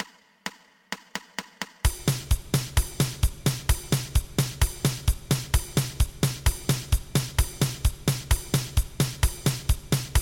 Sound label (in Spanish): 2 pulsos